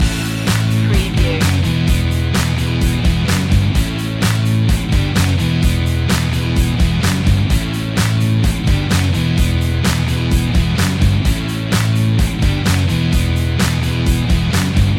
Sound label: loop